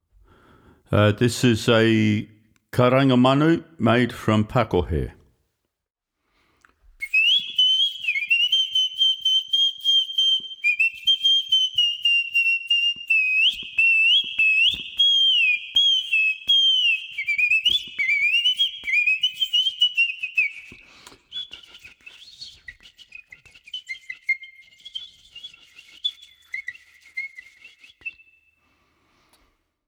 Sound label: Karanga